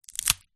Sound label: twig snap light; foley